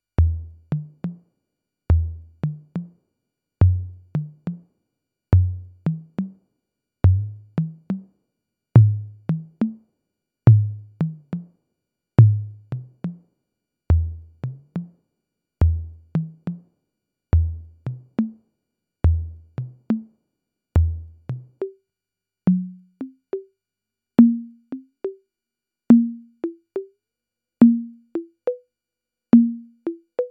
Toms Congas

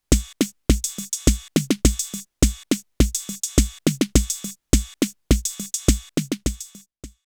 606 Drums

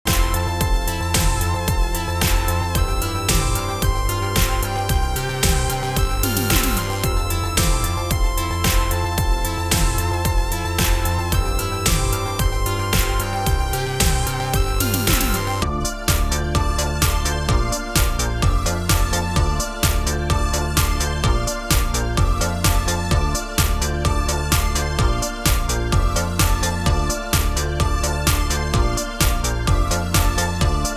JunoDS88 Retro EDM

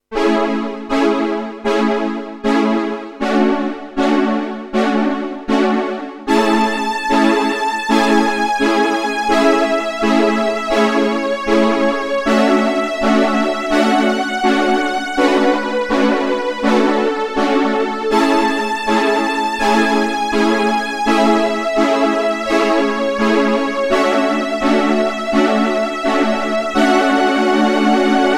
behringer ubxa pwm stringz

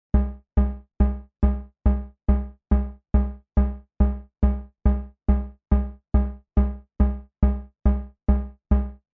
Kobol Bassdrum VCOs Noise

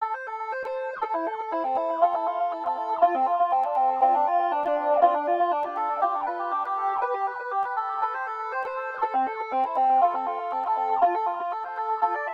ButterSynth iOS FM Rhythm

